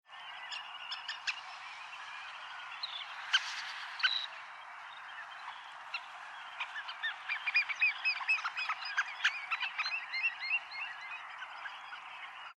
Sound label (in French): barge rousse limosa lapponica